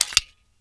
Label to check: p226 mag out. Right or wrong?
Right